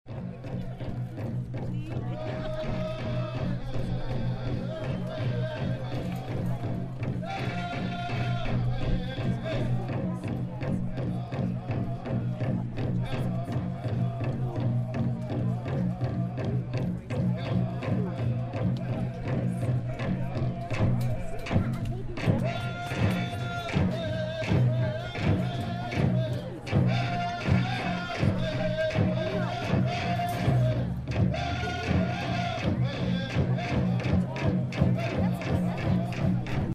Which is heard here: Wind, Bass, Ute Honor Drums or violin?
Ute Honor Drums